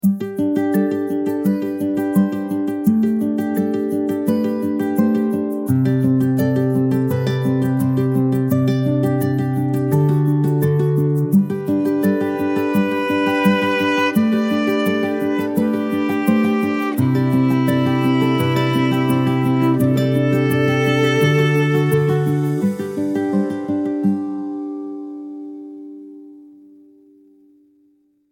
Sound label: hopeful acoustic strings